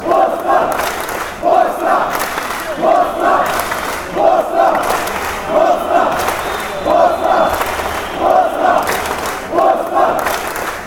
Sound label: claps; bosnia